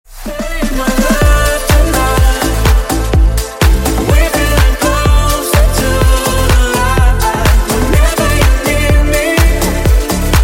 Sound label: remix